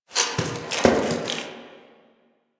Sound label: Box Of Trash Rustle